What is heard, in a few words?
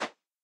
slide; ovo